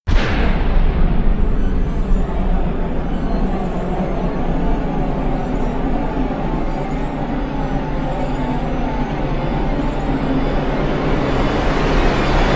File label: devil sneeze